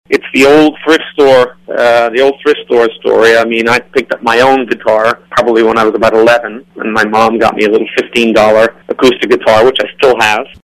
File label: first guitar